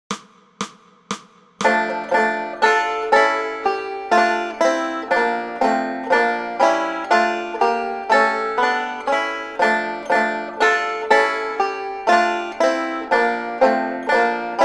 God Rest Ye Merry Gentlemen Banjo; christmas

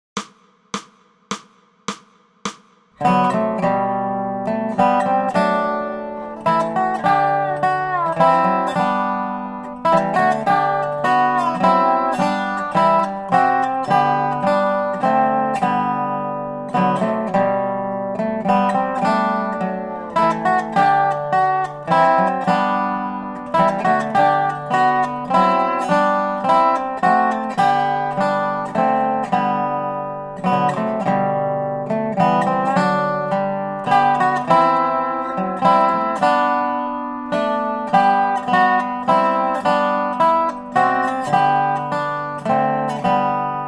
Dobro; christmas; The First Noel

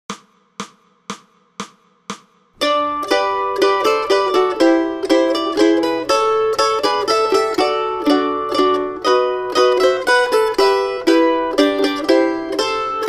We Wish You A Merry Christmas Mandolin 1 2 3

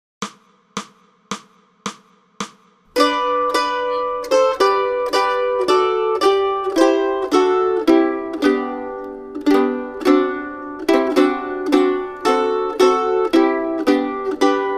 Away in a Manger Mandolin 1 2 3; christmas

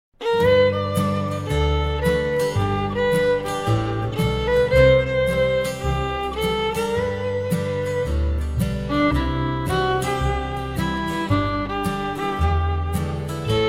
Fiddle

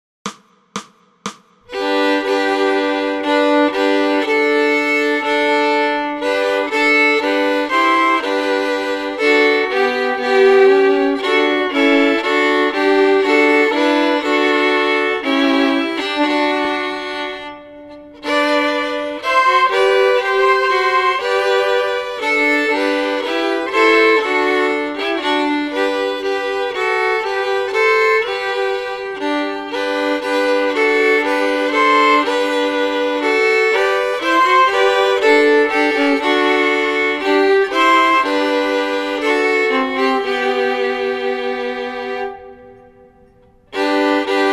Fiddle; christmas; Oh Come All Ye Faithful

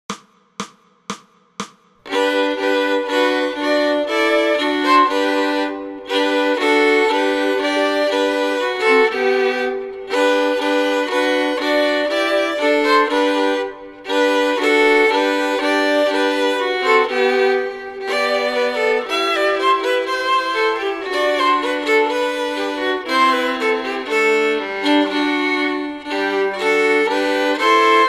Angels We Have Heard On High Fiddle; christmas

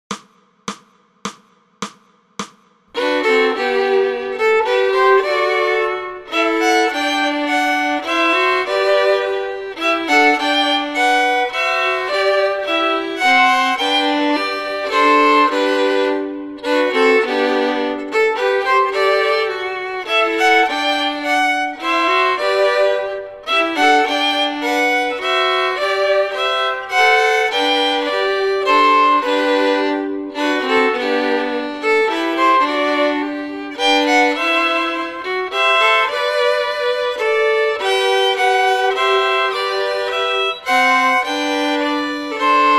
The First Noel Fiddle; christmas

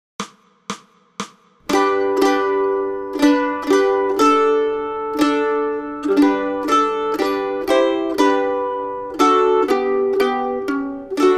Oh Come All Ye Faithful Mandolin 1 2 3; christmas

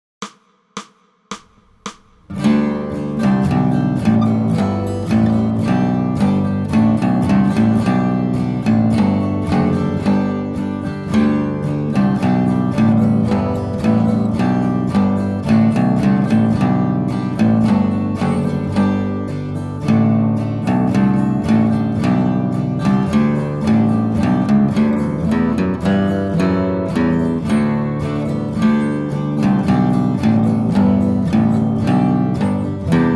Bass Solo; christmas; Deck the Halls

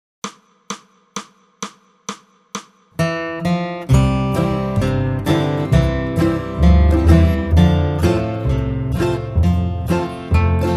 Guitar 130

